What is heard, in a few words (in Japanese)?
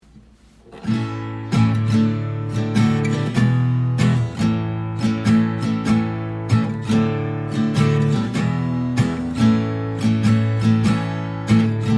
缶コーヒー ギター